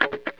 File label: wah snip, Funk Gtr & Wah